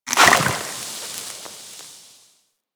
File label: Poison Hit Effect; Warframe